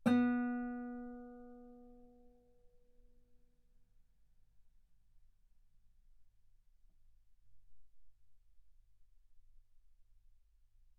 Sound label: Guitar B3; ff; sul B